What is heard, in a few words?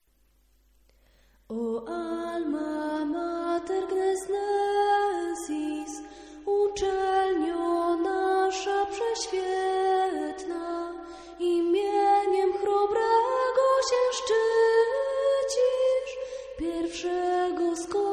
hymn